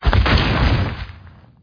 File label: Dragon Hit Ground